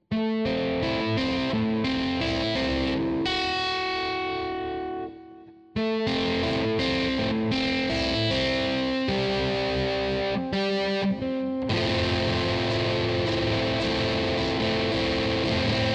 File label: electric; MegaEpix